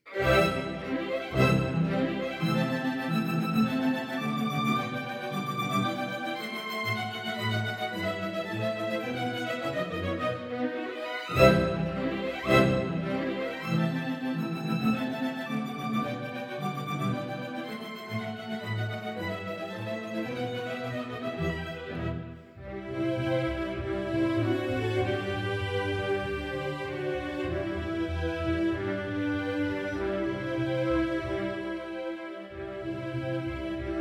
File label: strings; Arensky; Var VI; strings package